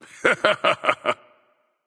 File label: "heh heh"; smite; voicepack; poseidon